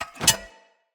Polearm Unequip; Weapons; Player; Sphere

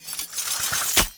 Idle Sword Twirl

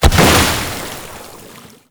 Bubblegun Pistol End Explosion; Warframe; Tenno

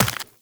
Run Flesh, Footsteps; Warframe; Tenno

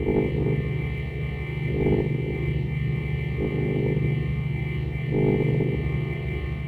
Weapons Cache Hum; Warframe

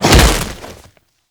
Throw Impact; Warframe; Kuva Lich; Finishers

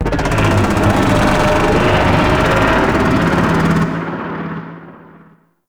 Void Dragon Vocal Idle; Warframe; Duviri

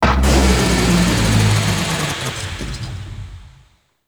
Gas City Power Down; Warframe; Corpus Gas City; Gameplay Remaster; Ambience

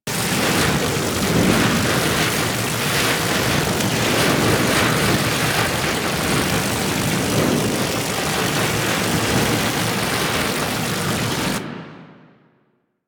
Amp Barrel Fire Loop, Amplifiers; Warframe; Operators; Corp Amp Set One; Barrels

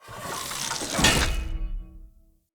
Pollution Tower Face Open; Encounters

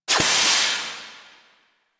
Injection Chamber Steam Blast; Ambience; Warframe; Grineer Ocean; Ocean Sabotage Injection

